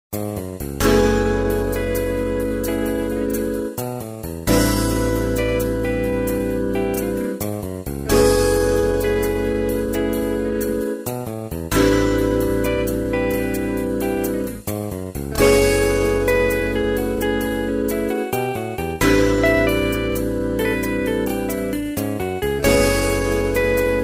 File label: Fusion; Sonora vol A